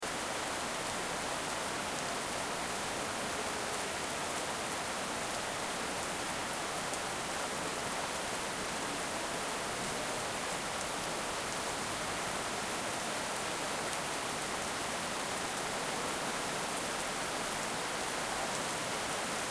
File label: Heavy Rain Sound Effect; fractured minds